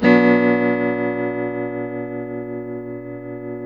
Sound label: TELTMDMIN C, TELE CHORDS, Guitars; Spectrasonics; Hans Zimmer Guitars VOL-1